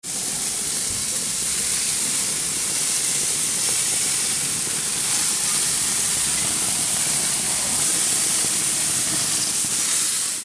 Gasgrill; Feuer & Grill

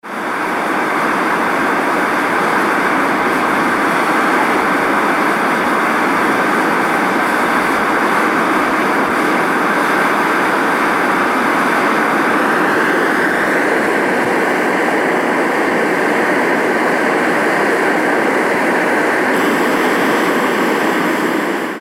Myvatn Rauchender Dampfkegel

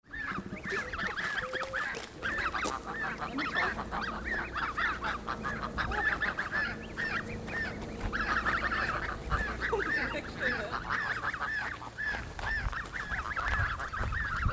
Gaense Enten

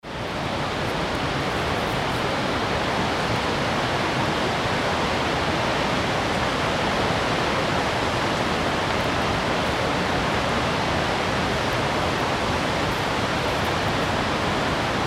Gulfoss Abstand

